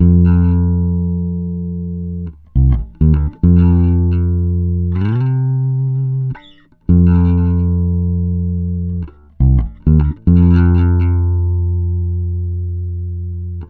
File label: PARIS 70, Bass Grooves; USB Soundscan vol.30; AKAI